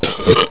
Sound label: burp